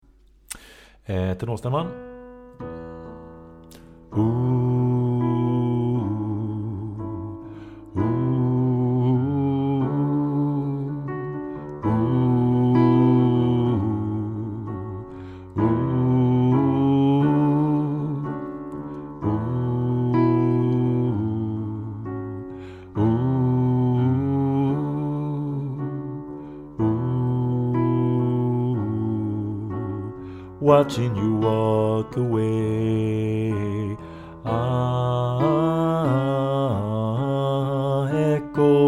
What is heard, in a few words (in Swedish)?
tenor